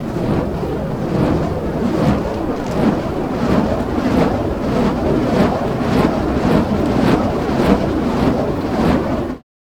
Fire Staff, Fire sound effects

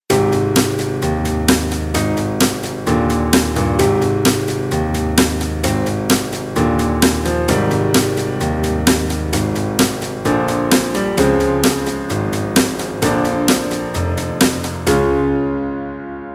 Piano March

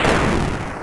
Explosion, Retro Game Weapons Sound Effects

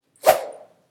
clean fast swoosh, Medieval Weapons Sound Effects

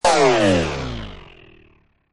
lazor huge, futuristic weapons sound effects; midhi

